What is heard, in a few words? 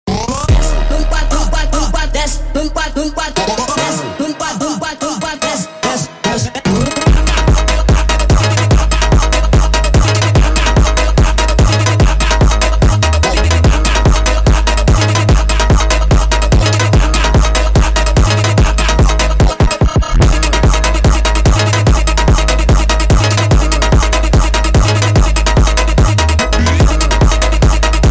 Desce Samba Sped Up